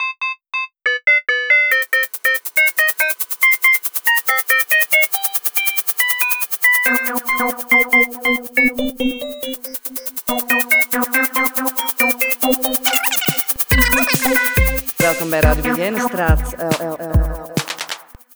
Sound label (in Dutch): jingle radio; begijnenstraat